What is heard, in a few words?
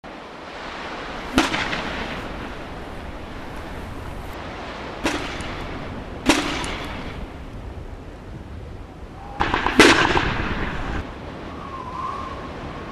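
battle, ambience stereo; mohaa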